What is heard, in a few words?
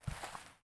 Walk; portal quest android; Magic Shrek